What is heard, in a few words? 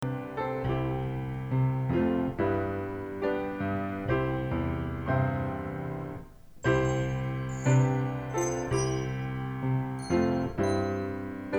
Bell Choir